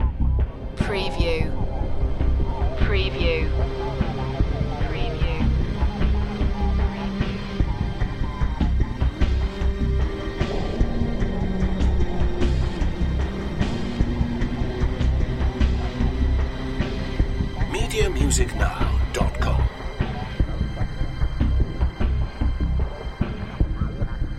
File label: distorted fragments loop